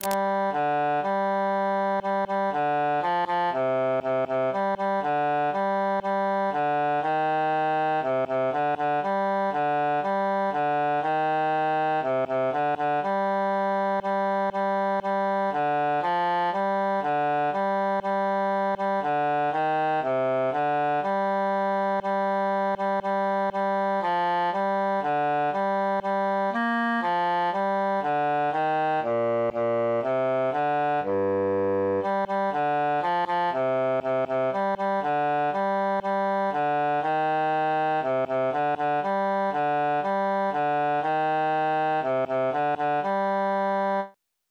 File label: bassus solo; la la la